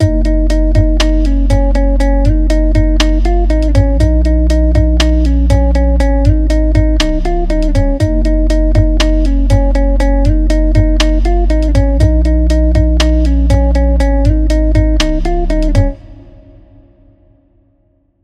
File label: Animate Expand Guitar